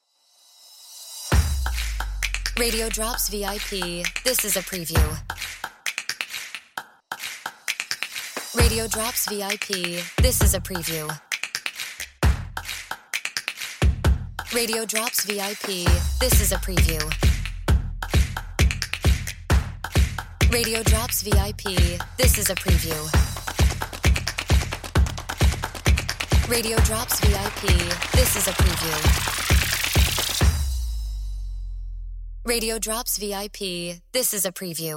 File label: Upbeat Snaps